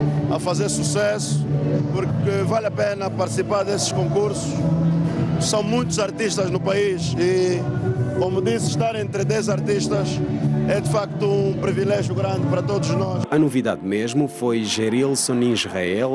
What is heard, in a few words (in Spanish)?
Salsa Cuba Casino en La Habana